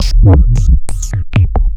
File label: Drums Strange